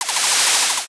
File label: pencil write short; daily life